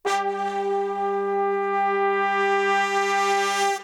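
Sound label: brass, Disco; Best Service ProSamples vol.48 - Disco Fever; AKAI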